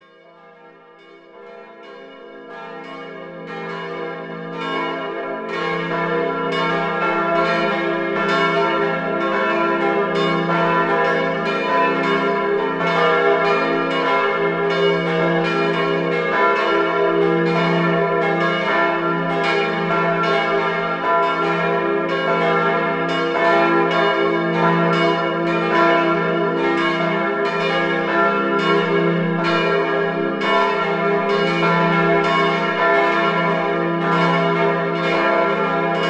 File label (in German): Glocken